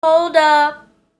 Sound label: Vocal, Infinite Soul